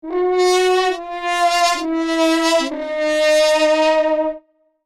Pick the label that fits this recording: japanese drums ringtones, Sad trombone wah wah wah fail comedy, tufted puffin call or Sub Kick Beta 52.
Sad trombone wah wah wah fail comedy